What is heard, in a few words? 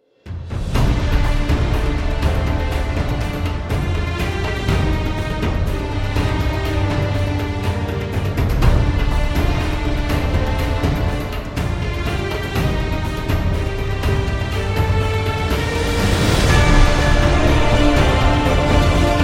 Rhodes